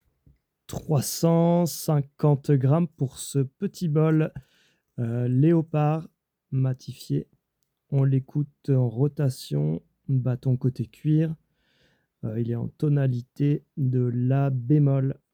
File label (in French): Bol Tibetain Matifie La♭ 121 mm